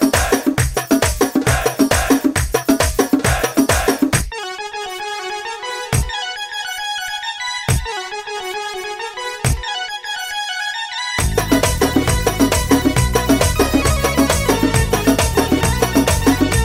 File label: dans music